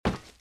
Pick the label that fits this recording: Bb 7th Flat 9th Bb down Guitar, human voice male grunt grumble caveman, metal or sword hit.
metal